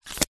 household knife slide in plastic protective cover sheath